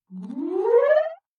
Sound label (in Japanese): スライム 威嚇, モンスターボイス; voice monster